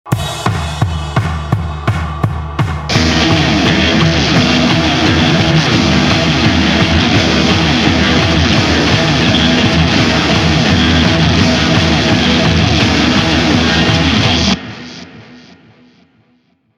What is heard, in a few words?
Drums